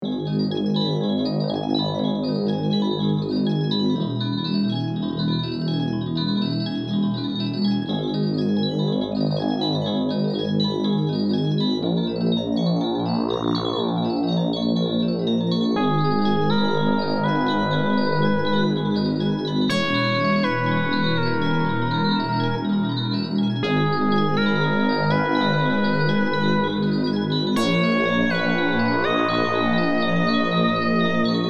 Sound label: ominous bass arp and riff ab minor